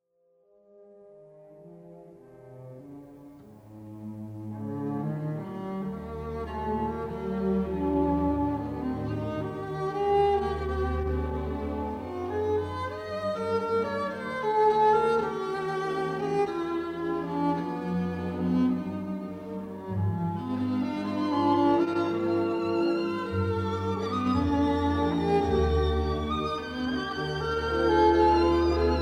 Dueto

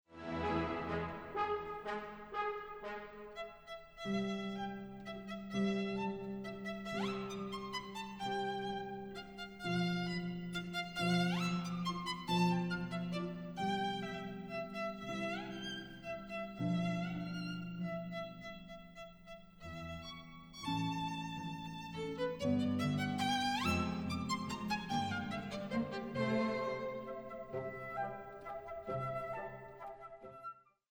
Trio

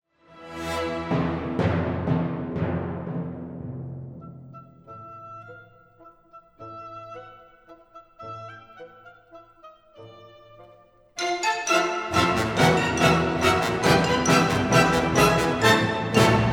Scherzo end

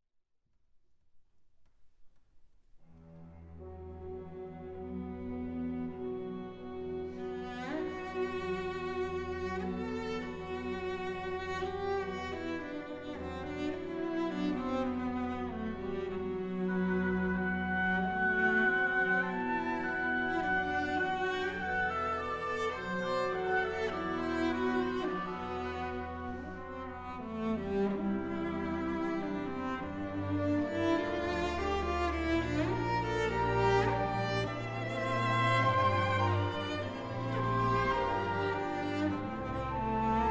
Cello Mvt 1 Opening

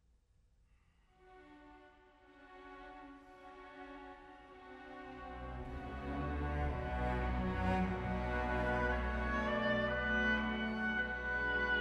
Oboe

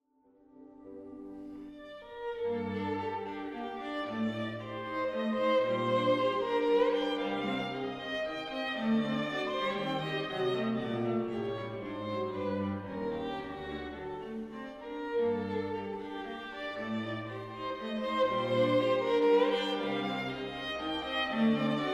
Menuetto

